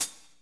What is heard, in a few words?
HAT, Drumscapes Roland, Slow Shuff